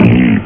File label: Awful Fart; ChooChoo Heroes